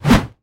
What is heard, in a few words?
Swhoosh; Gameplay